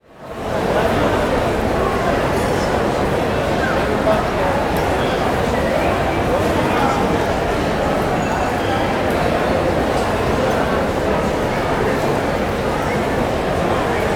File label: Station Crowded Bus, Walla; Sound Ideas; Walla Series